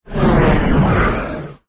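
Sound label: Movement Void; smite; god bakasura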